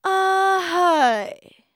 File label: VOICE, EASTERN FX; ILIO; Vocal Planet